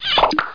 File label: CORK; Let's Talk About Me!